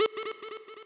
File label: sub lead a3